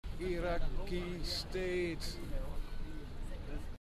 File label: loop